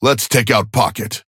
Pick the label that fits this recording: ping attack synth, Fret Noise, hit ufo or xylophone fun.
ping attack synth